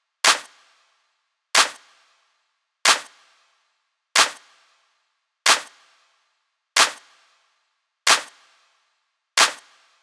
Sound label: 092 Hip Hop Clap, Percussion; Digidesign; Pro Tools; Pro Tools Essential Loops